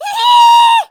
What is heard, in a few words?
halloween scream